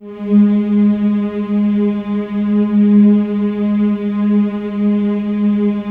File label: G#3, CHOIR; Spectrasonics; Symphony of Voices